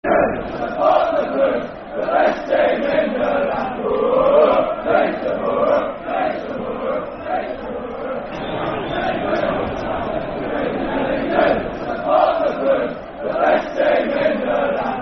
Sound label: Football Chant, Soccer Song; It's Posh We Are; Peterborough United Pufc